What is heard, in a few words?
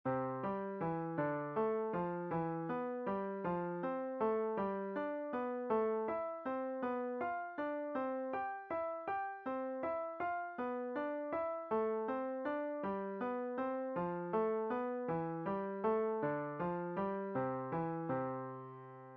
Five finger skip, piano exercises